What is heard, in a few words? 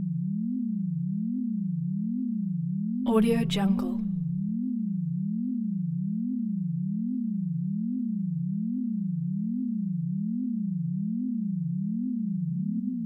woo woo sci fi tone dual tones; prosoundfx